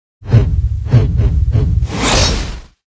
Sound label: Attack; Seer; GamigoNA